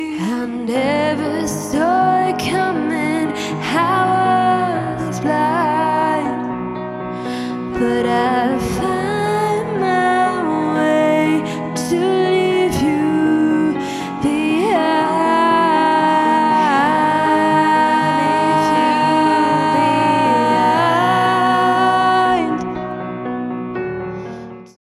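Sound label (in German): vorher, Handy Aufnahme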